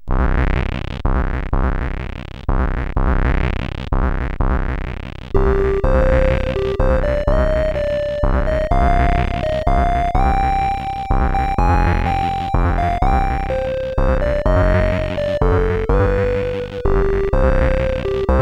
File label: Pirate, CSG8580R5